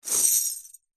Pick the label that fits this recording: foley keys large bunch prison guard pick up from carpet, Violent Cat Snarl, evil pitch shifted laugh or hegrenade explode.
foley keys large bunch prison guard pick up from carpet